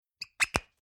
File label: Bar Whiskey Liquor Bottle Closing Replacing Squeaky Cork